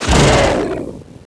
monsters death; oblivion darkangels

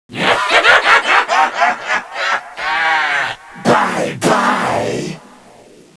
zombie win; zombie plague

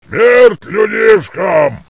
zombie burn

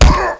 man hit

